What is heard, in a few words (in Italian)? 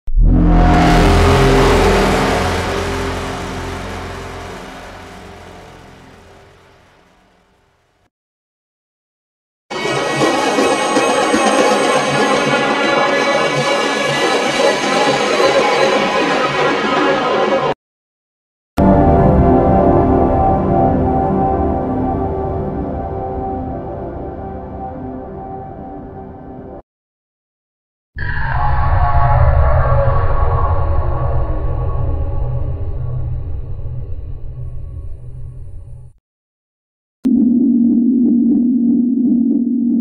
suoni horror urla spaventose creepy sounds; bhoot sound; effetti sonori ai horror; scp-096